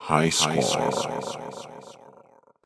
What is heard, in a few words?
Hi-Score